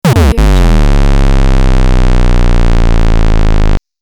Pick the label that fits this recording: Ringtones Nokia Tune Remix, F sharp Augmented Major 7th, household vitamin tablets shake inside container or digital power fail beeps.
digital power fail beeps